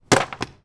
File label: Book Falling